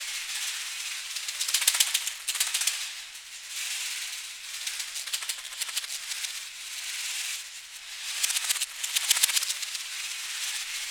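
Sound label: African shaker FX; Roland LCDP14 Africa VOL-2; Perc FX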